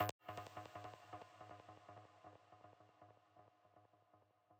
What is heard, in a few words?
arp